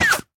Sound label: gun miss; Blind Drive